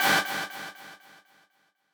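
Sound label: b stab1 a, note G octave 5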